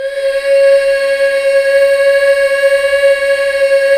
MMHH, BACK. MMMHH Fem

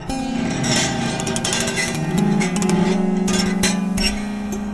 Eternal Strings; Sonic Foundry (Sony Creative Software); Loop Noir

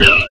Vinyl Snare; Sonic Foundry (Sony Creative Software); Esoterik Beatz; Vinyl102 Kit

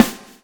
swing

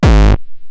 Roland TR-909 BD; BD Selection

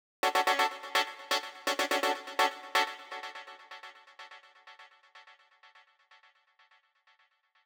Harmony Synth, 125bpm Bm; Zero-G; Nu Disco; Construction Kits; Fashion Week

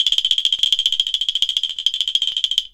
CLKR TOY LO; ILIO; Synclavier Percussion 2; World & Orchestral

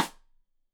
Side Stick Stereo; Deeply Sampled Drums; SMDrum

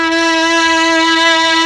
STRING; ILIO; Propeller Island Legendary M400

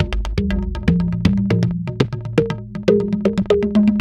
TALK, TALKDRUMSYNT, Yamaha VP-1; Music Store

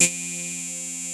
WINY LEAD C3, Analog Fantasies; USB Soundscan vol.49; AKAI; YAMAHA

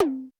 Roland U220 Electric Set, Drum Machines

